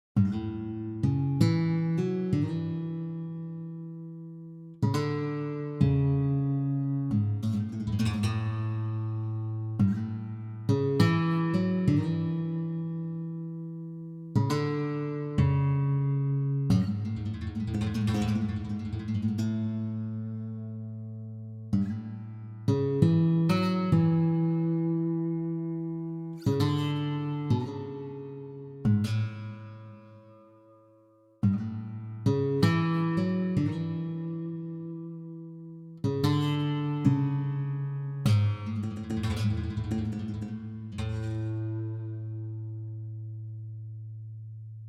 gitarro; Muzyka Ilustracyjna

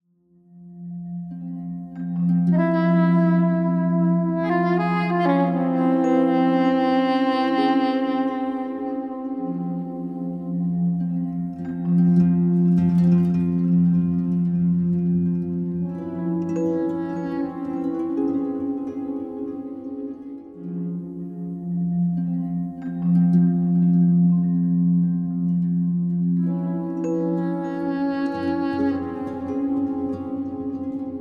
violin jewish; Muzyka Ilustracyjna

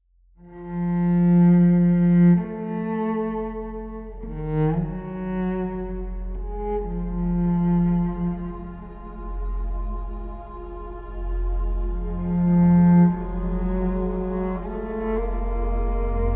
violin jewish; Muzyka Ilustracyjna

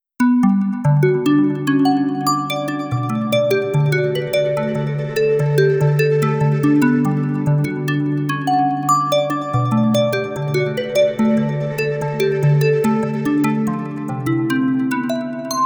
Hip-Hop; Muzyka Instrumentalna; Podklady

